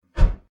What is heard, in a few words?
toss